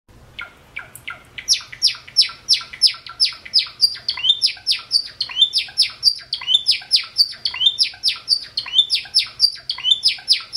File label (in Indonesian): suara tembakan gelatik jawa